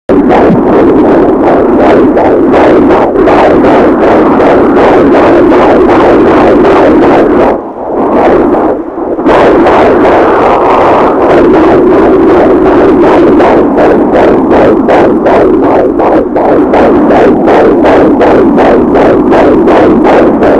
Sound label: Baby Beat